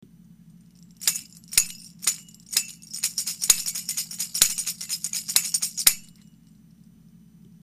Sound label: tambulin; 牛乳パック; 王冠; リサイクル楽器展示室U; 紙パック王冠タンバリン